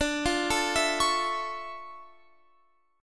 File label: D Minor Major 9th D